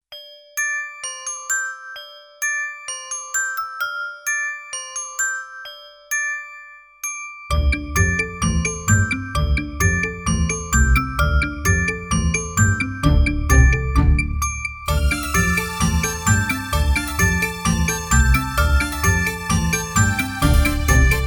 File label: instrumental